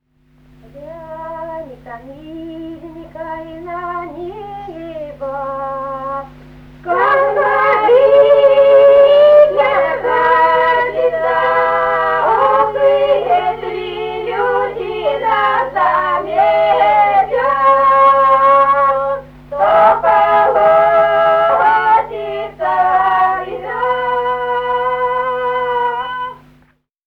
лирическая; Доброе; Глянь-ка, миленькай, на небо

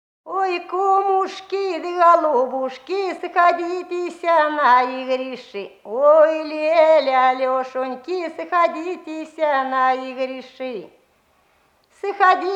Ой, кумушки-голубушки (плясовая, на вечорках)

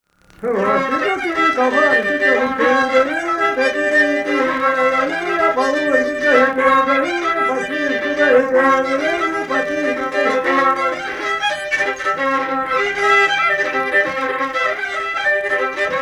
Камаринская (с пением); Кульнево

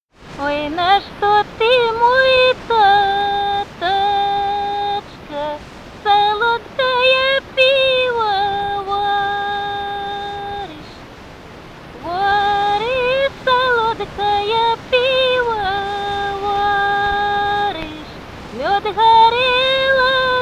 свадьбишная; Ой, на что ты, мой таточка; Соловьёвка

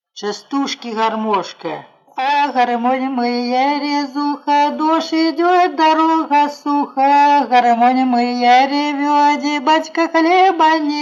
частушки; А, гармонь моя, резуха

